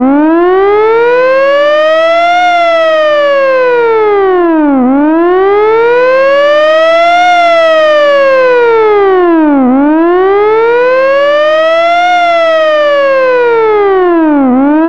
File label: vintage hi lo siren